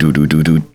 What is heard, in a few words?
test music